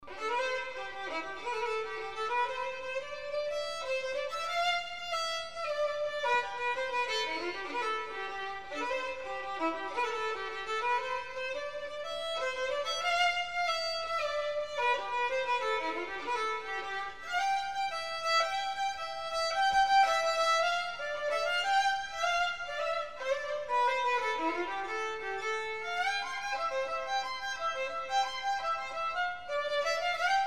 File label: Celtic wedding music - solo violin; Irish Wedding